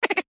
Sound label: cartoon laugh mouse high pitched